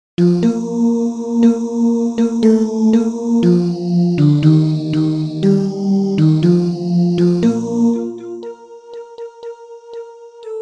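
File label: tenor; Boar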